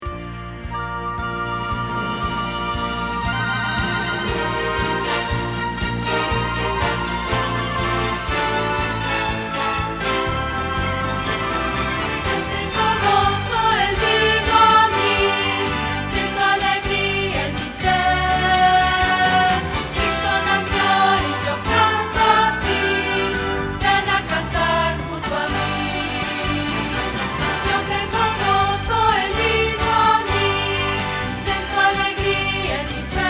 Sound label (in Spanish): Contralto